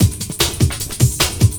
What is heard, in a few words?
Smooth Beat, Drum N Bass; Sonic Foundry (Sony Creative Software); Knutrix Drum & Bass